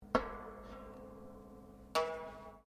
Hammer dulcimer effect, Old Chinese Hammer Dulcimer; String; Hammer Dulcimer Effect Mf; Musical